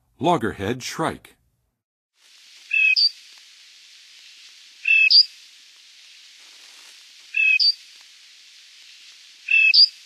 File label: Loggerhead Shrike, Songs of Birds of North America; Western USA; Western USA Songbirds